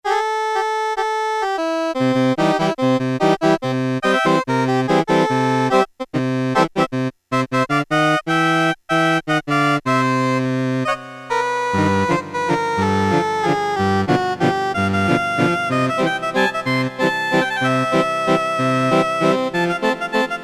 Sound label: accordion, Casio MT-68 preset sounds